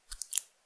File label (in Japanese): くちゅ音単発, ASMR